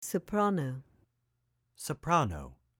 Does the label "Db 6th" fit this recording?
No